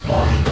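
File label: transform; aggress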